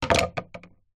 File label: household hairbrush set down wood floor